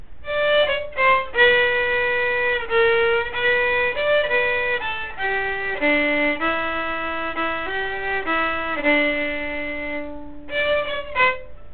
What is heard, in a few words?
4 measure melody, fiddle